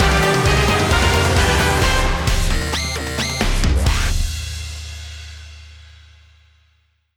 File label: Battle (Victory); paper mario the thousand year door complete; Magnus von Grapple